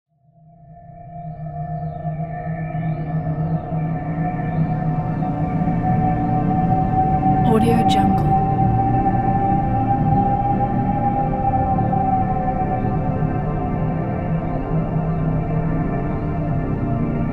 deep space ambient; infinity sfx productions

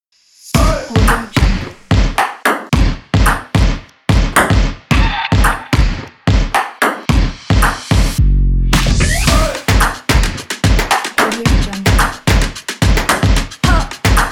rap ping pong

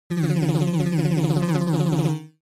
ui sci fi data transfer comic

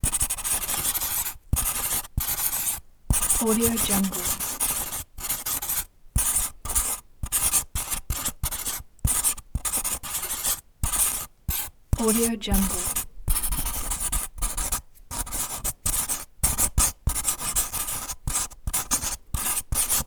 marker writing

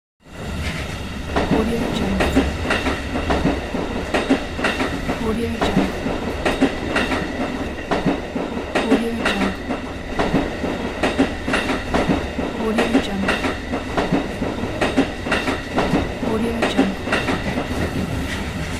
train ride; quattro-boom

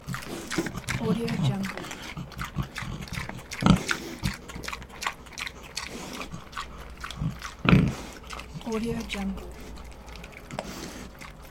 single pig feeding and grunting

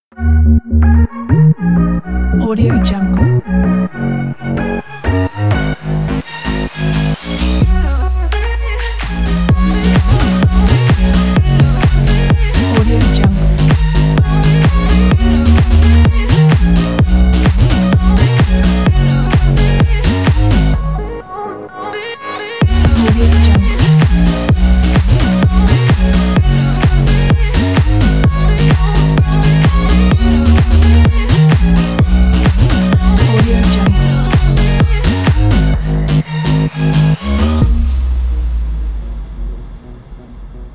rock this club; loopslab

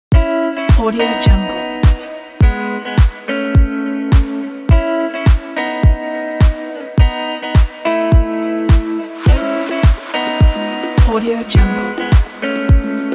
deep house

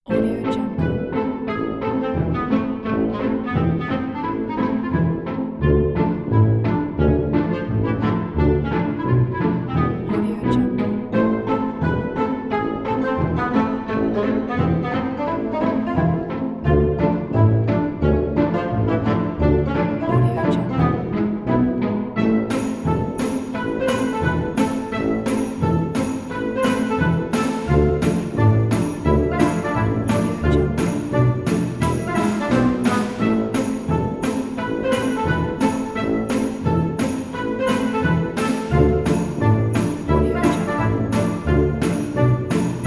it is comedy music

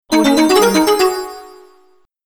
martial art event

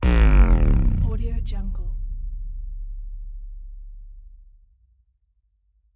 bass drop

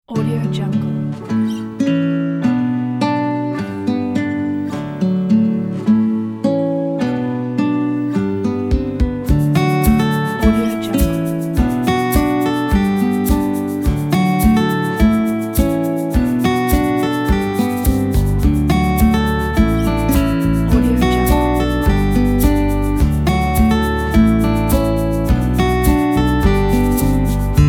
happy carefree acoustic guitar

